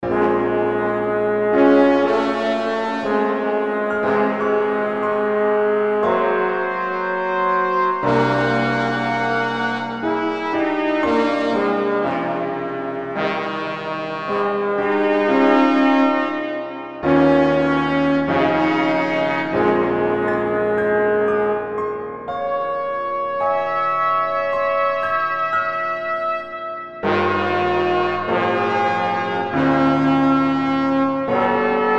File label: mini piano 22 brass 22 edo brass piano